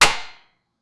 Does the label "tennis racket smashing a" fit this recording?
Yes